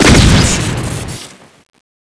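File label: double barrel, scattergun; svencoop; clcampaign; weapons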